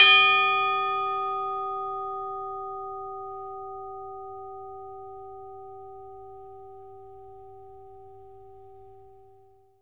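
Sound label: CHIME F5; Synclavier Percussion; World & Orchestral; ILIO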